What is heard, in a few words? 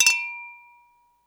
glas